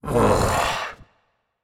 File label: ugh; Smite; baronsamedi